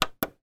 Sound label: music